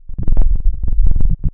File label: ARP ODISSEY, wavetables